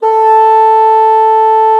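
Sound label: bassoon forte A3; Xsample VOL-6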